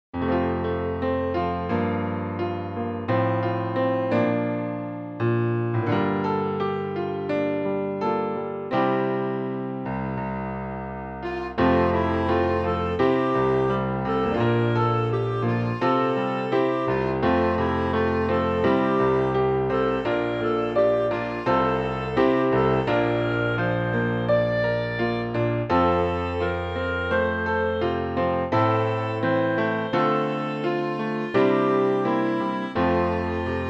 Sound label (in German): Klavierbegleitung